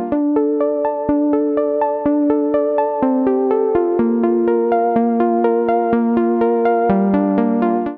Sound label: intro 16 beats